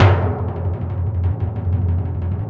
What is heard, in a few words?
Orchestral Perc, Timp FX